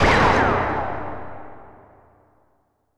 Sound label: boom; First Person Slapper; StudioFreresChasseurs